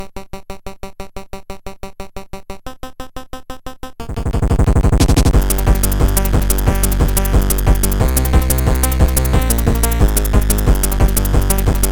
only chipping; APPLAUSE

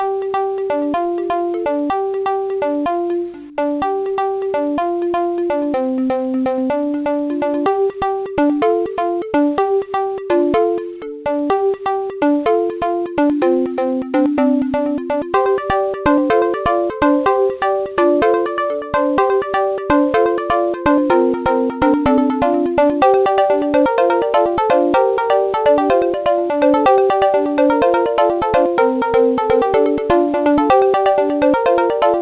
short chime; Trackers; Modland

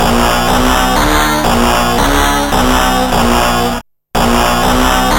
ditched jingle, Trackers; Modland; Rogue Male

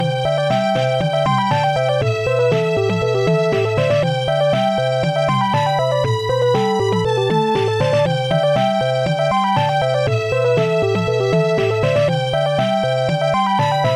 eighties pop; Instant Music

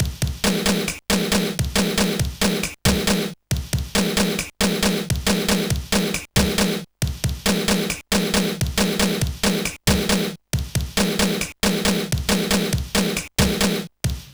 Dynamic Drums Pattern; EDDIE II

